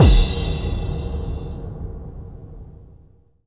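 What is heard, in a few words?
bass drum FX, dance drums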